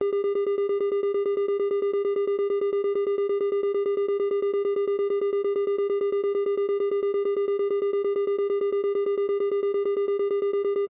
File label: science fiction warning beep constant computer console; zapsplat